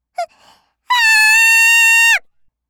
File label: kyaa; gaya